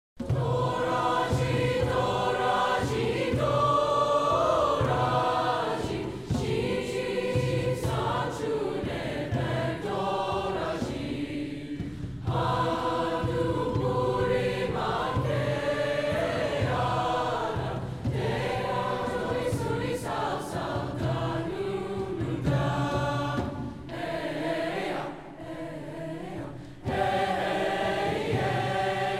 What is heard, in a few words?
TORAJI TARYUNG Korean Folk Song, choirs; SENECA VALLEY; spring concert